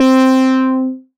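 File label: pluck C4, EMS VCS3; Polyend; Simulacrum Sounds; 1 Shots; Synths; Leads and Keys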